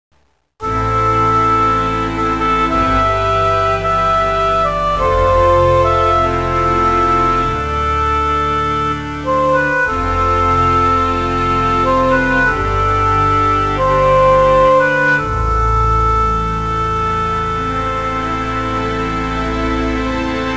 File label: Jailed; Torin's Passage; MS-DOS